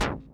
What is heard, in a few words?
snare, Millenium MPS-400